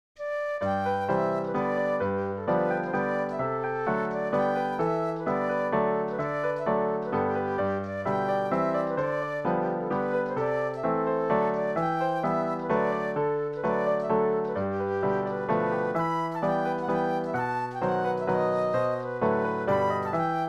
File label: Scottish Polka Valse